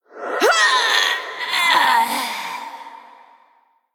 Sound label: Female Troll Drakkari Death